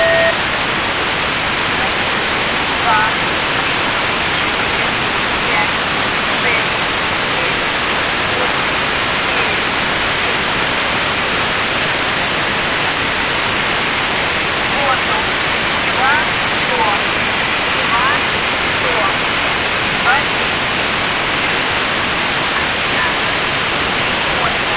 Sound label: airhorn